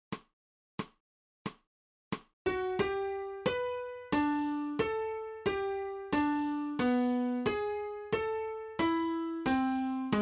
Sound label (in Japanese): 新フレットレス